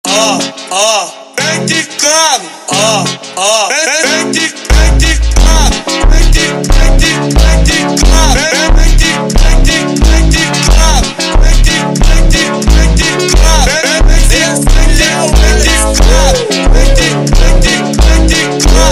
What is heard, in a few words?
Voz ultra Slowed